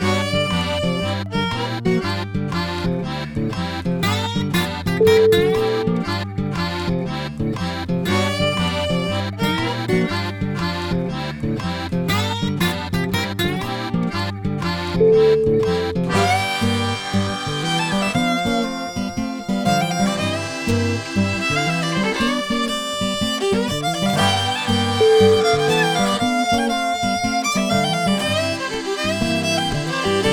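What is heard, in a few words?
cajun road trip, music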